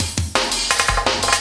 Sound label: loop, Drum & Bass, 170 BPM